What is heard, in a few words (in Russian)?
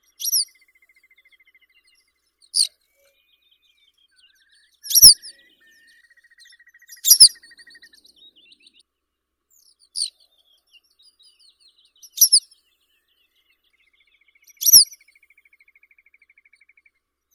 zvuki prirodi tryasoguzka zelenogolovaya motacilla flava taivana